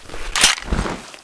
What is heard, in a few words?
pm9 clip out